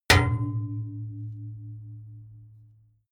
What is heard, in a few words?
metal impact small hard long